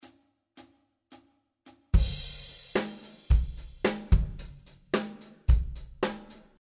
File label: syncopation